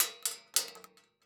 Weapon Bullet Shell 12 Gauge Shells Multiple Drop On Metal Surface; AdobeCC